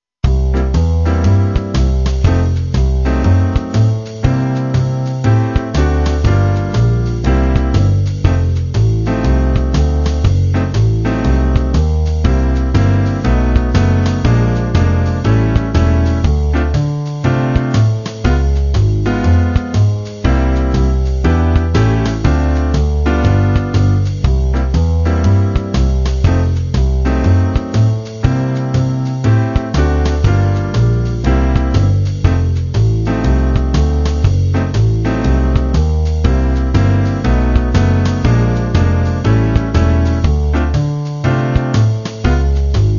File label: blues